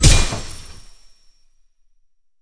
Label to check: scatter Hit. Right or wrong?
Right